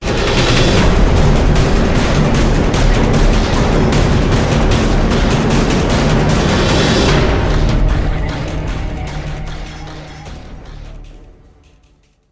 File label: victory; opfor